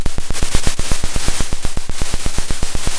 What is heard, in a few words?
pop, Sound of a drum roll